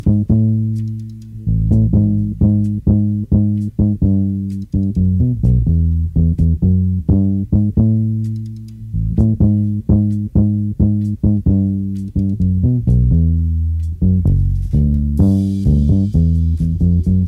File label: bass